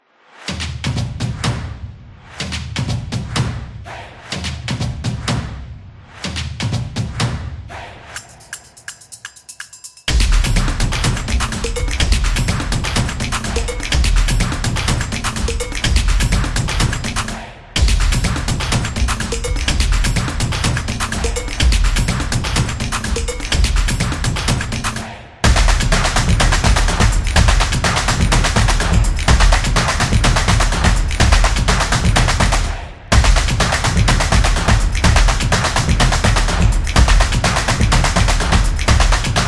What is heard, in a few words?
Jogging, soccer drums